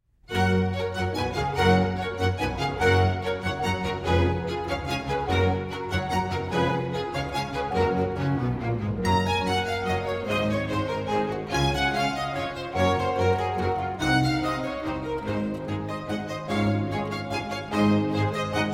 Concerto For 2 Mandolins, Strings and Continuo in G Major, Allegro; III. Allegro